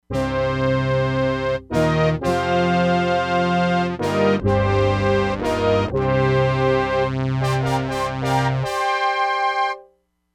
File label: BRASS 5th, Crumar Bit 99; Factory Programs